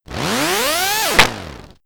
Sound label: Failure, alarms; J Edworthy 2019